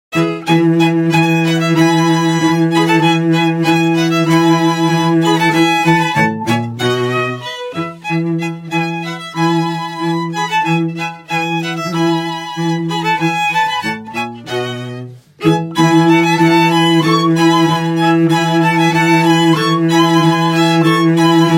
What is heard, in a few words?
strings